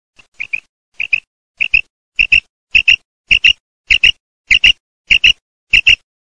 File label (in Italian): QUAGLIA